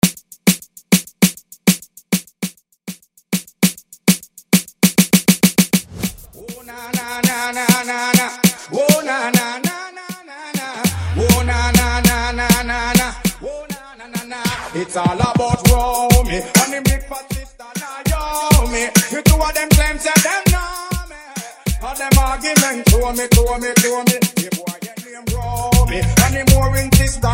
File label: intro percapella 100 bpm